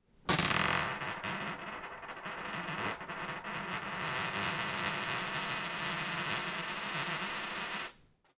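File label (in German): Münze; zoom h4n pro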